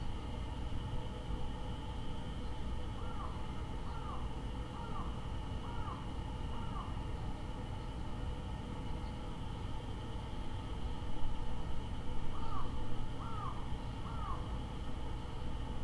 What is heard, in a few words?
indoor broadcast room evening; LOOP; anemoi